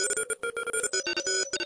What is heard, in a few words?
short morse, Filtered Morsecode Like